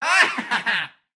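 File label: Damage; Bronzo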